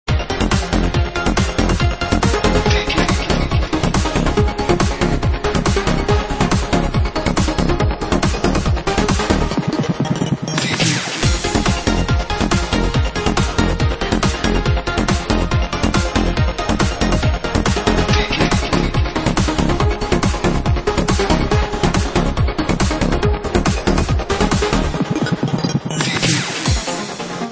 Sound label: Amusement Park Loudspeaker Remix; Boulder Dash